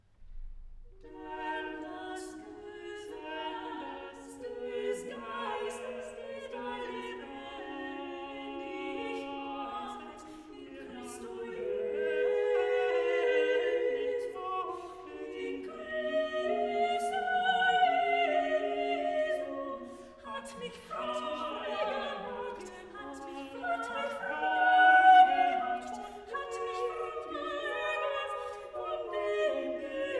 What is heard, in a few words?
Motet; Denn das Gesetz des Geistes; Bach; BWV 227; Jesu, meine Freude